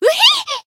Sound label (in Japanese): Voice damaged jp